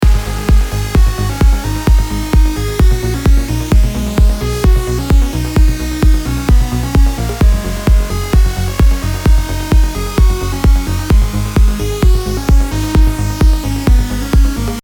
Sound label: full bassline